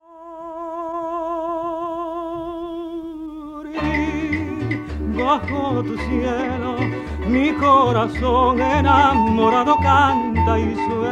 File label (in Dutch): zanger met harp; Antillen Aruba